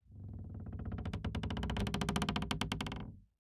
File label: tree; ambient; Counter Strike Model Pack; CS:GO; survival